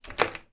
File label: household key mortice in door turn